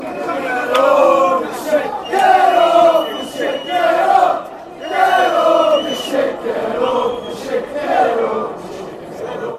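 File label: get em carlisle, football chant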